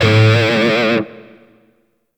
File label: Shawzin Flourish Oct One A; Warframe; Grineer Shawzin